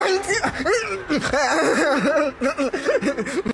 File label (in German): rückwärts lachen